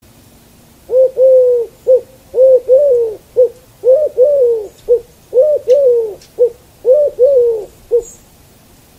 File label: Mourning Dove